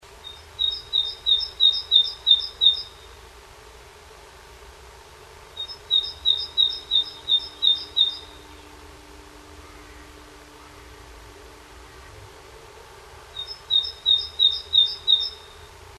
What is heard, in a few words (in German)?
nestbau rufe, nistkasten